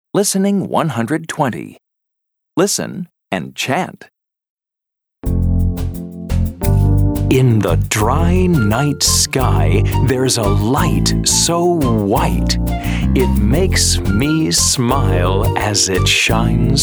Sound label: Chant; Family And Friends; Family 3